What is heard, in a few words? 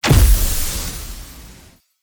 Magik Explo Rune Hit; Powers; Runes